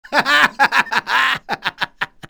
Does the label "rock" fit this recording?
No